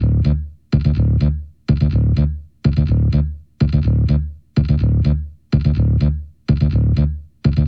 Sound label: Bass, 125bpm, Dm, Synth